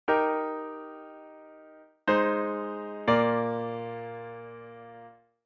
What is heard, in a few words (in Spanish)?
Semicadencia Iv a V, Eb; auditivo de cadencias autenticas plagales rotas y semicadencias; Identificar la cadencia